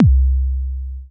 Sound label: ELE BD, Drums Dance & Groove; USB Soundscan vol.11; AKAI; ELEC. KIT